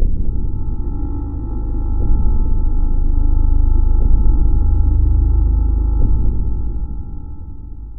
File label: ambience; slender